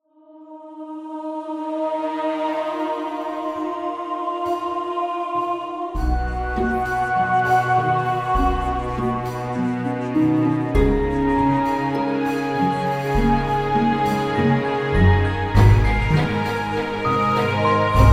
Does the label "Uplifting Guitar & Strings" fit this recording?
Yes